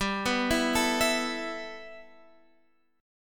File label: E Suspended 4th G; arpeggiated; Esus4/G